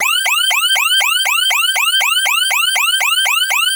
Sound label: iot waring